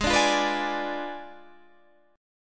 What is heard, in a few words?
G sharp Diminished 7th G sharp, Guitar Standard; G#dim7 strummed; JGuitar